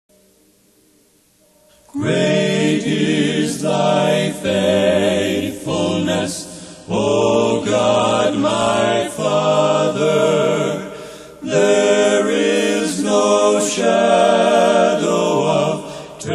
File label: Old Hymns A Capella